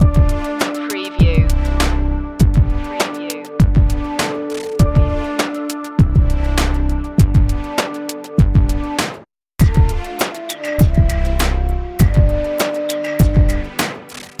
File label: urban mood loop